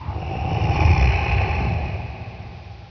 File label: echo, cave